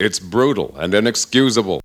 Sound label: VOX; Zero G; Man Machine